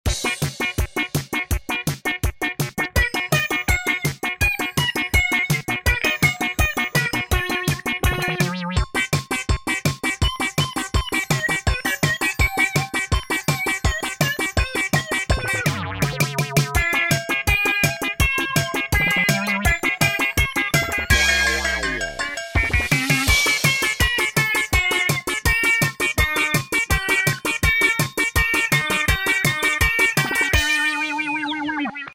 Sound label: Frosty Floor Fill-In